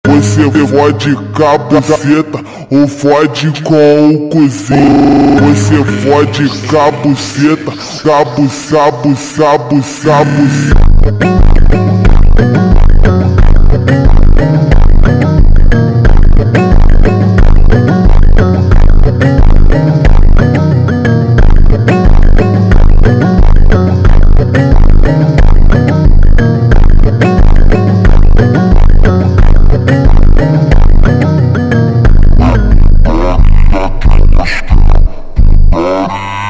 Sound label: Funk super Slowed